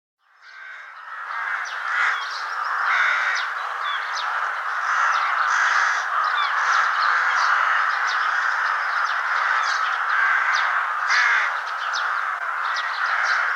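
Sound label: cries of a flock of rooks; kriki stai grachej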